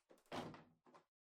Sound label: Air Purifier (Far Panel Open)